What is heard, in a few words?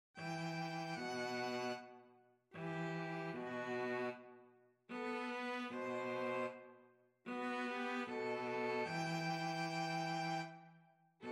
violin cello duet